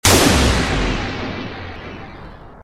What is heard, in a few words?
penalty; sound effect; n64; Tetrisphere